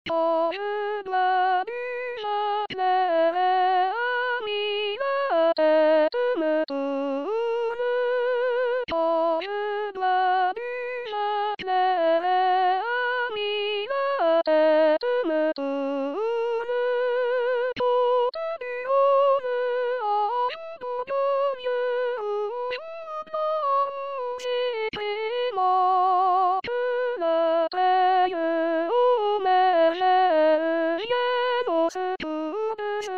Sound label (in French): soprano; l harmonieux vigneron